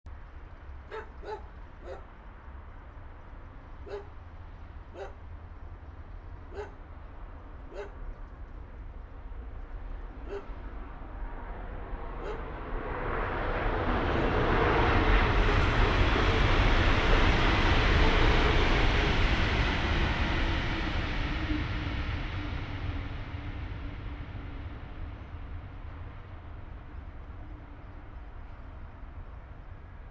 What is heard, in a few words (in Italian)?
Treno; Eigenmike; ComunePR; NuovaStradaF